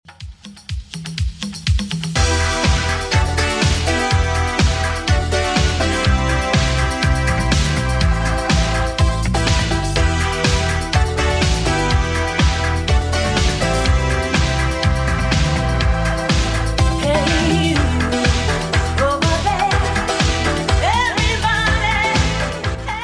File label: 80s Redrum 123 bpm CLEAN